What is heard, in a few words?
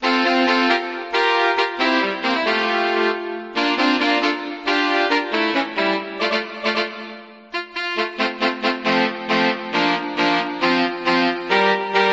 brass sketch